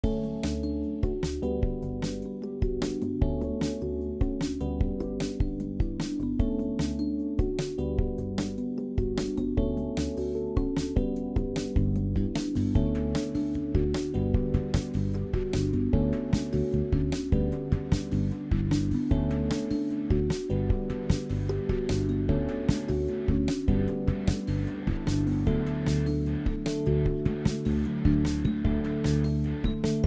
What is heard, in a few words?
Suburb Theme Radio Music